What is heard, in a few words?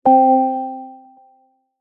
multimedia game sound childrens soft warm single chime generic